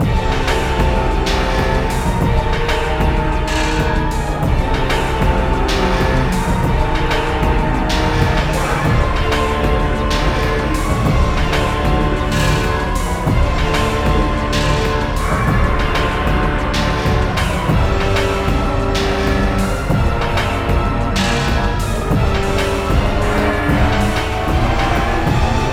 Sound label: Defense Drone Boss Theme; Metroid Series; Prime 3 (Wii)